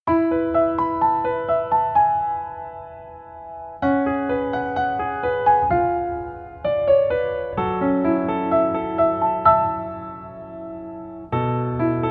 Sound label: Piano